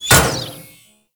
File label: Kunai Impact Default; Warframe; Hit Effects